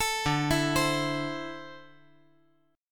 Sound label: D 7th Suspended 2nd down, chord